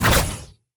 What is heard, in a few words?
Attack Jump; bloom minigame